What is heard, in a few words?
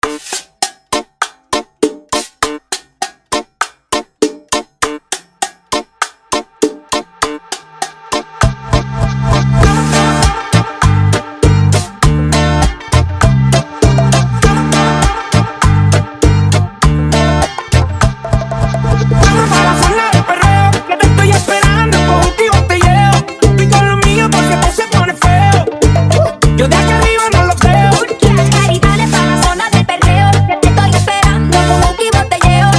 Bachata rework